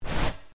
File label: transition fx; criminal case game